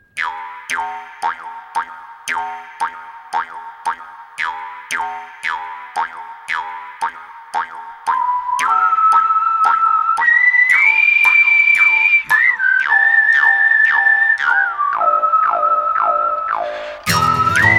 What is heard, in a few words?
(guitar version); Cowboy Bebop; Cowboy Bebop CD Box Set